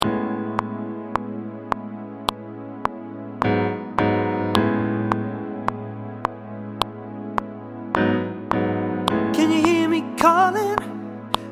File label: Alto